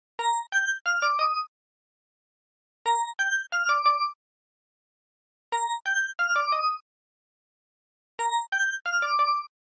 ringtone; steven universe

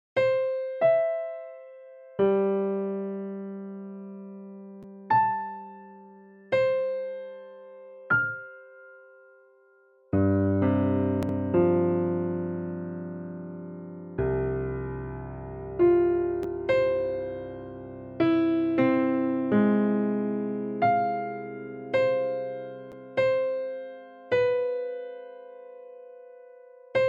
Virtual Piano

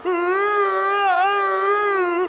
uueeeeuuhhaahueeeeuh; the rock

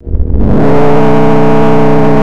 Robot Roar

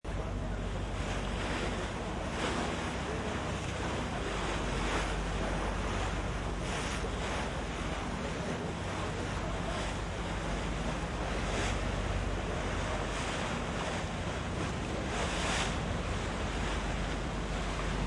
water splashes from a ferry

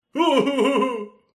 ogre hurt

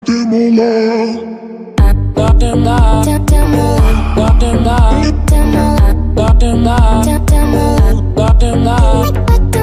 vihuuu

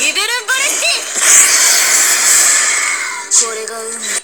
Computer funeral march

